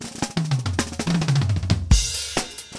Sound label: Drum Solo; TSMtoolbox